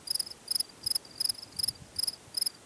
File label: Hub Mansion Cricket; Levels Hub Mansion; Cricket Amb